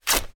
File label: Cotton Rips, Torn T-Shirt; InMotionAudio